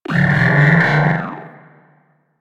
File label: Talking Machines; Ambience; Warframe; Corpus Railjack